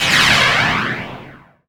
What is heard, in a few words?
NOISE, Production Effects